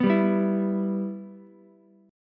E-Minor, Ukulele; strummed; JGuitar

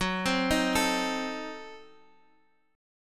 F sharp Diminished 7th, down; arpeggiated

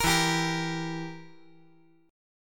E Diminished down

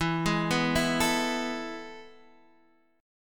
E Major Add 11th E, chord; E Add11 arpeggiated; JGuitar

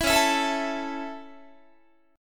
Db Minor Sharp 5th Db down Guitar; strummed; Dbm#5; JGuitar

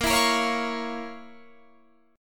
F Major 7th Suspended 4th Sharp 5th Bb

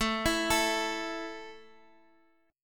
A 5th A down Guitar Standard, A5 Chord; arpeggiated; JGuitar

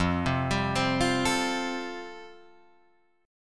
F Major Add 9th, Guitar Drop D

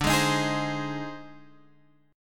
Ab Major D, Chord; strummed; Ab/D; JGuitar

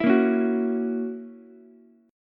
Gb 7th Gb down Ukulele, chord; strummed; JGuitar

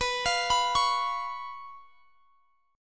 B Suspended 2nd Flat 5th; Bsus2b5; arpeggiated

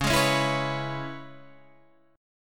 C sharp Minor 7th C sharp Guitar Standard; C#m7; 9,11,11,9,12,9; strummed; capo=2

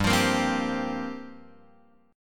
G Minor 11th, chord, Guitar Open F

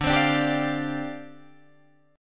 D Minor 11th D down Guitar Drop D

